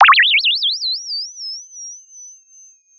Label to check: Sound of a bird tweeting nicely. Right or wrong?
Right